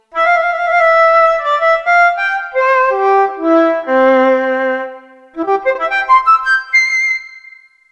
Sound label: Classical C Flute